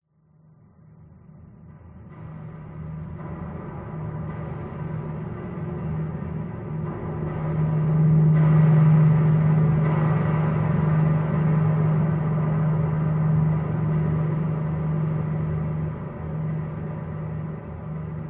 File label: science fiction drone dark mysterious tapping